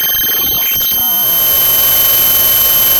Sound of a cat meowing

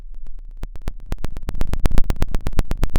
pop, Sound of a fire crackling

